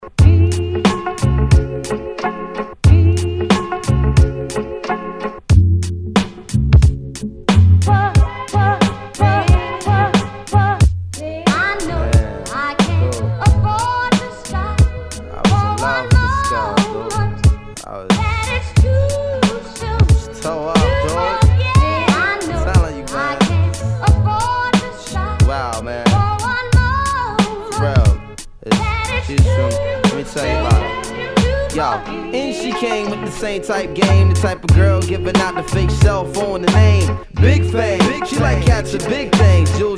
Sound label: 90s Intro 90 Bpm